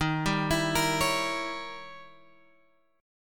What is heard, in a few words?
Eb 7th Flat 9th, Eb, Guitar, Open F; arpeggiated